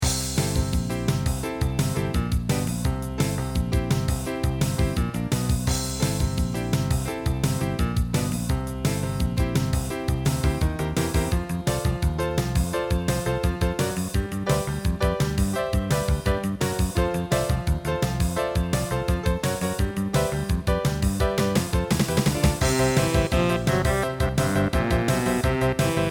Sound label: Jazz & Banjo